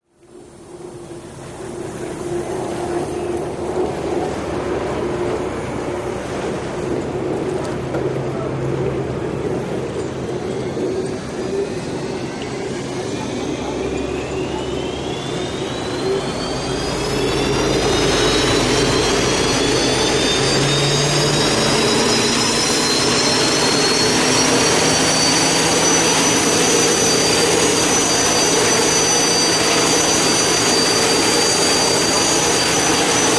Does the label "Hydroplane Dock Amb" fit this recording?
Yes